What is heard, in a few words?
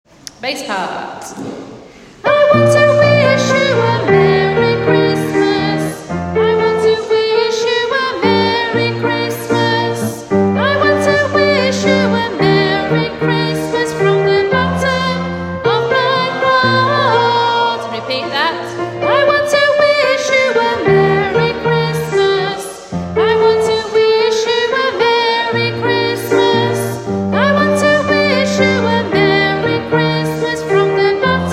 bass